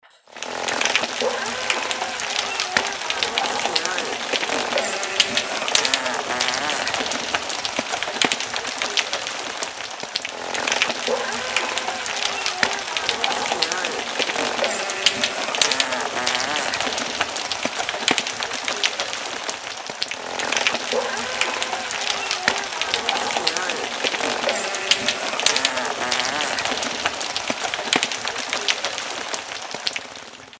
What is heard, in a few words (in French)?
archipel ambiance sonore